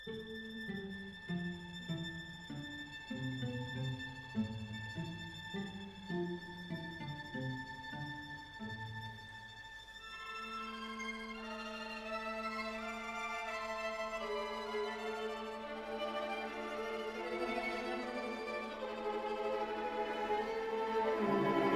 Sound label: Quartet in A major Variations Andante; Op. 2; Mozart